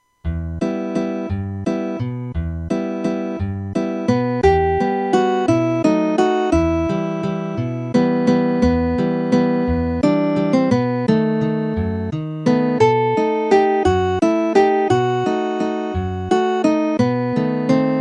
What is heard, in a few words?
Clavelitos synth; Joaquín Valverde